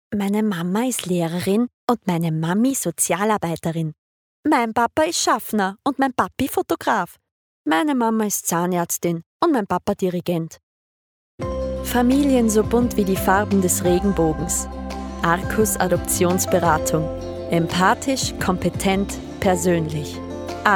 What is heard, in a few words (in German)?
Werbung Musik; Arcos